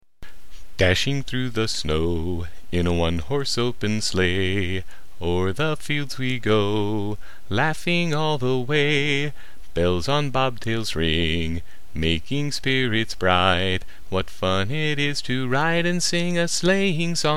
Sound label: Jingle Bells